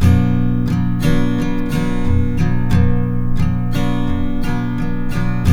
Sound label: MIN C, Guitars; Spectrasonics; Hans Zimmer Guitars VOL-1